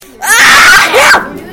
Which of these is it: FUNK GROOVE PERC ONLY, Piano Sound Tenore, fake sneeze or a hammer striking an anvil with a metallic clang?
fake sneeze